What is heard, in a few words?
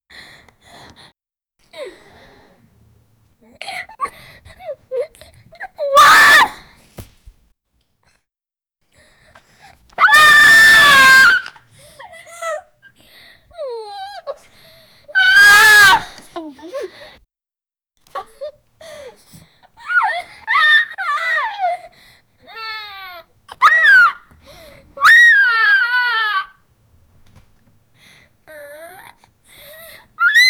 the girl is actively invo, emotions